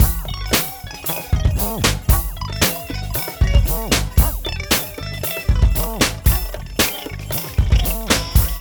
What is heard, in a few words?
mix, funky loops; East West; Funky Ass Loops